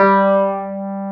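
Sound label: Wurlitzer G3 - L; USB Soundscan vol.09; AKAI; Keyboards Old School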